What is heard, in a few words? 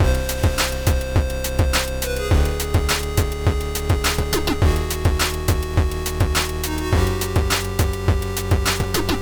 lead; cube-tube; pyramids